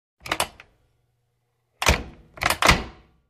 Emergency Exit Door, push bar

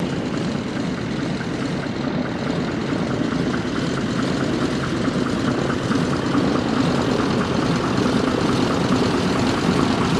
Sea Plane Taxi Off

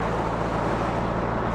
Jeep Chrke 84 Constan; jeep cherokee 84

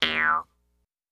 Jews Harp Shrt Boin, boings

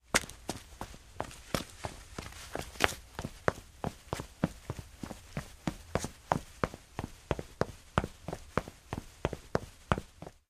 Footsteps Male Jogs

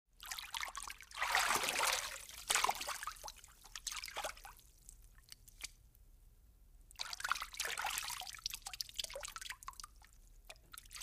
Very Light Water Move, splash water

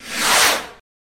Stinger Sci Fi Whoosh

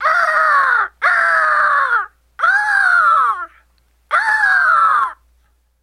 Eagle Scream, Caw x4

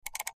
Highpitched Mouse, mouse

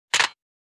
Futzed Phone Receive, hang up

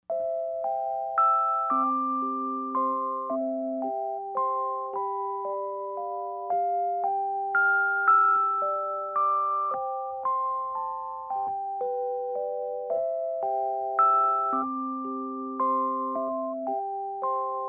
Toy Piano Play

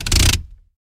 Car Setting A Parking, parking brake

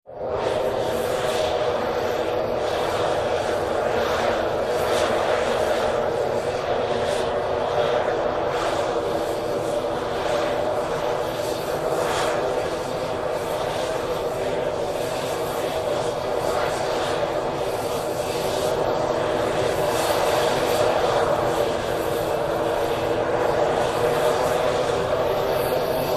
Jet Engine Idle L101, jets

